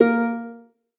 lyre

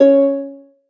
lyre

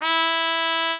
mexican trumpet

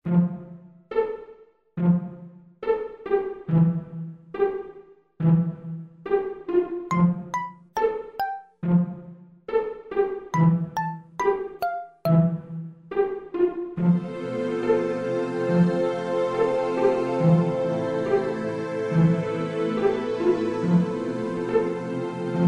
old timey

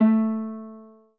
spanish guitar

